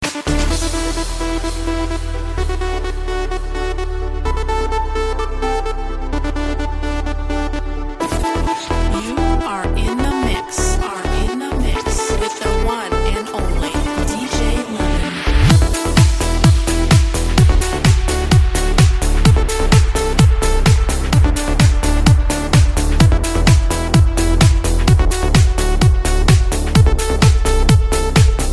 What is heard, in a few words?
dj mix